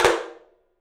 open tom; 3D Sound; 3D Drums

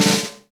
V-Pop Snare Ghost, Roland BK-5; Snares

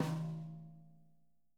Living Drums, JZ XTOM 1; Sampleheads; Peter Erskine

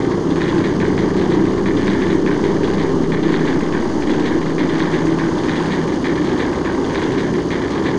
Drone Ambient; Drums & Drones

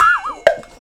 TEK DIJERY, industrial; Roland - LA Composer vol. 1

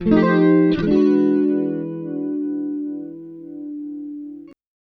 92 BLUES, Acoustic Guitar Loops; Electric & Acoustic Guitar Loops; USB Soundscan vol.04; AKAI

